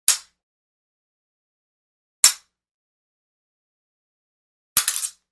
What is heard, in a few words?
Foil Sword Hit 3X; Hollywood Edge; Martial Arts and Human Impacts